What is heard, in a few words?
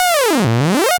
Beat